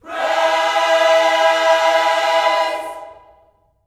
PRAISE, Vocal Gospel

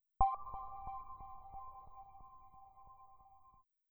135 Hit; Zero-G Trance Inducer; 90 s Sample CDs

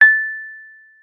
WURLITZER A6; Xsample VOL-1; WURLITZER RS

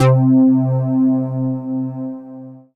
Dance Floor Bass, Multisample Basses

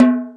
Hip House, drum machine; E-MU Pro-Cussion-1

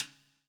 POWER RIM

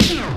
FAT SLIDER; Pro-Rec - Synthsonic; TRINITY

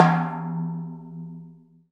PER Percussion

